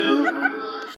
haha

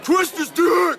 growl

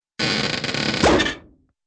wooden stretch and pop

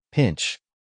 pinch crab

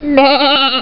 baaaa; adobe; easter egg

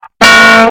goofy sounds bonk

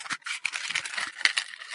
tabacco box

